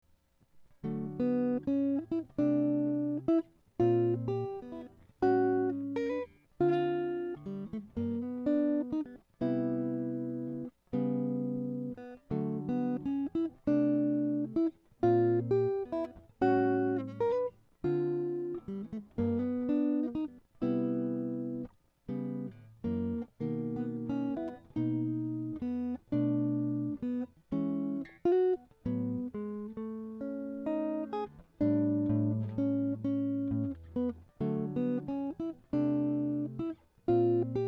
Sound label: waltz